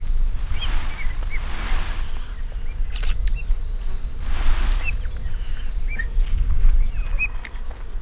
tollazatat rezegteto madar szel, pava; felsolajos zoo 2015; cardioid

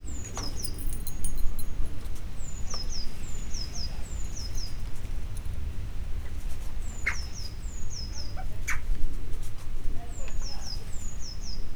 kulcscsörgés; állathangok; miskolci zoo 2009; szarka

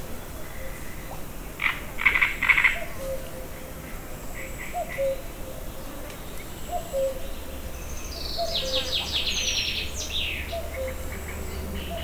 tovább repül más madarak és rovar is, kakukk; Tiszababolna

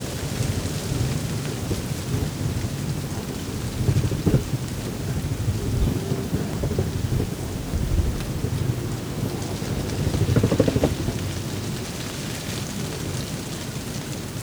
barlang páran, denevérek; repules; termeszetben

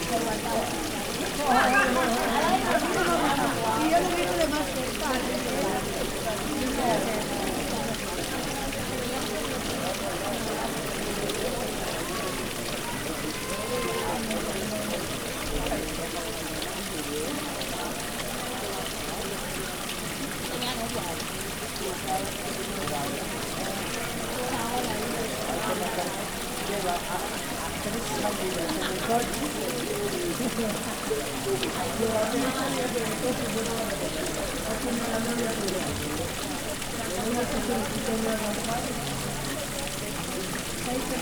viznyero meszkita

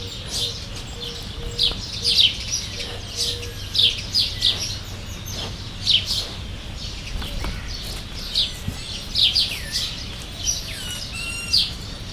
megszagolja a mikrofont, láma; jaszberenyi zoo 2015

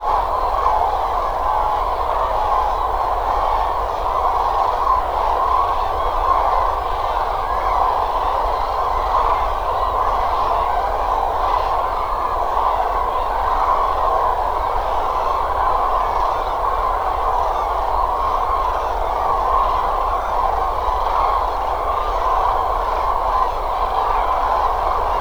daru; termeszetben

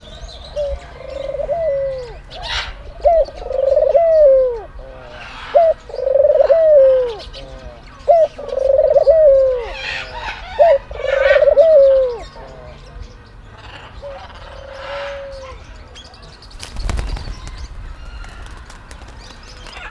kukruuu, kacago gerle; állathangok; nyíregyházi zoo 2010

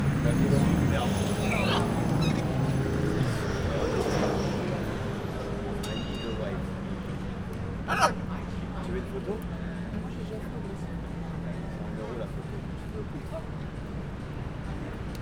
ara papagaj

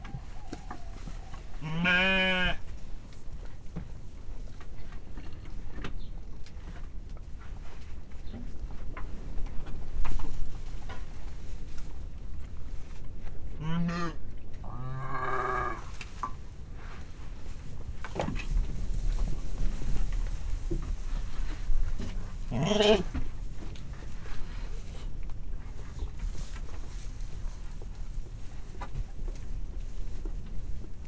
megszeppent állatok, házi kecske; Hortobágyi Nemzeti Park 2013

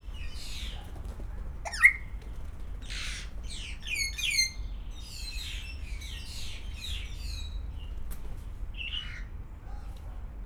békésen, jákópapagáj; szegedi zoo 2011

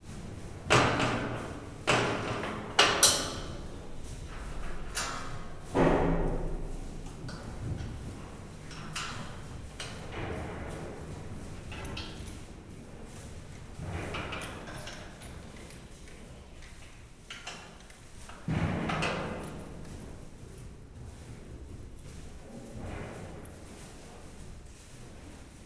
rácsozat kinyitása oroszlánok beengedése; szegedi zoo 2011

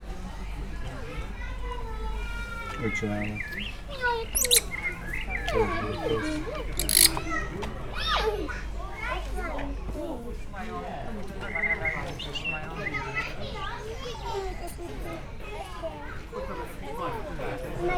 látogatókkal körülvéve, bolíviai mókusmajom; allathangok; veszpremizoo 2013; akg

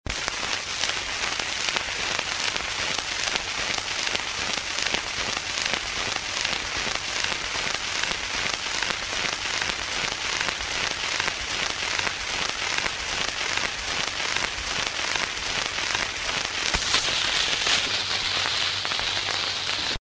tu serceges, gramofon

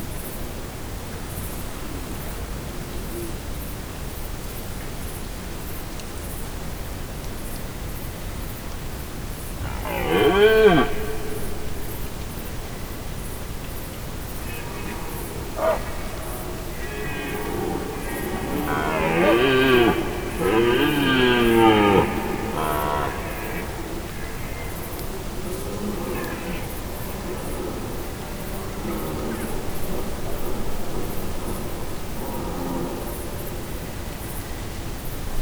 ház előtt bőgő bikák, gímszarvas

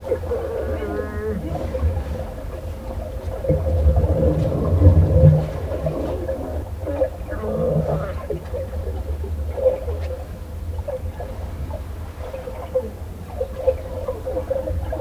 kaliforniai oroszlanfoka uvegfal mogul szelvedo; állathangok; nyíregyházi zoo 2010

